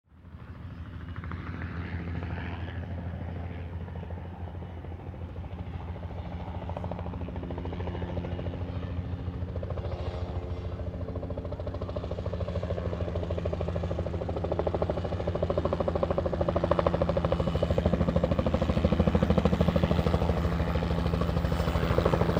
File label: helicopter landing